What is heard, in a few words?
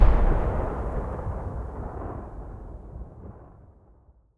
gate pass